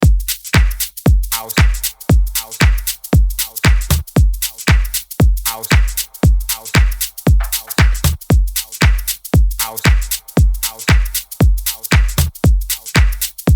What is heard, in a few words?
Funky Man